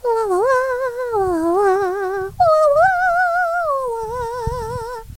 Cute singing

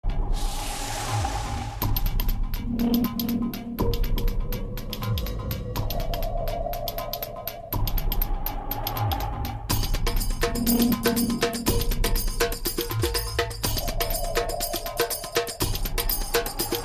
folk

